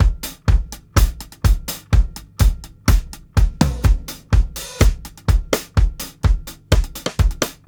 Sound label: 125 HALF TIME X STICK, DRUMS LOOPS; BASEMENT DRUMS VOL 2